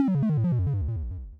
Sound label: death